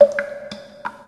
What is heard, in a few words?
drop, underground; ambient